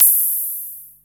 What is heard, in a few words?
FIZZZ; WORS STATION; DANCE #3